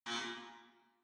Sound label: Electromagnetic Buzzer Hall